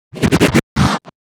Scratch Fills 140BPM

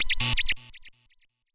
Zapps, sci-fi

